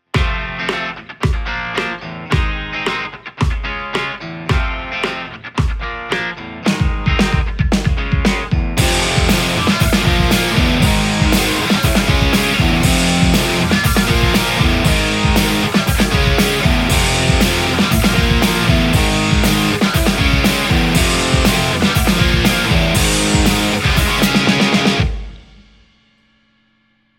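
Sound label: Telecaster; igniteamps nrr1 v2 00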